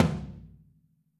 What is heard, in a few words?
FLTM OH L, Kit OH Mic, Drums Percussion